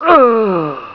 DYING; BattleSNDS

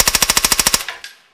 silencer burst

